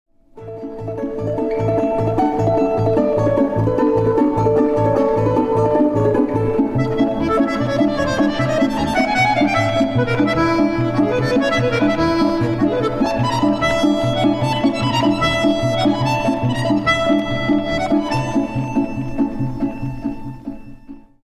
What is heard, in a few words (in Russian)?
Проходка под мелодию